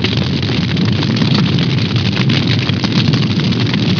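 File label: large fire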